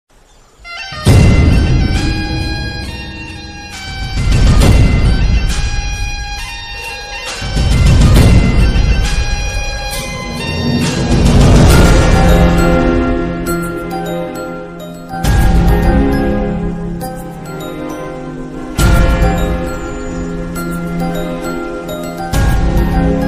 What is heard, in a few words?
tamil love ringtone love bgm ringtone